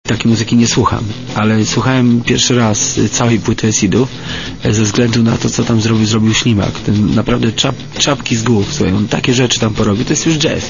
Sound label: jazz